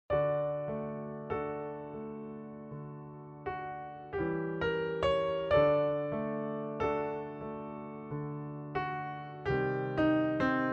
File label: ALTO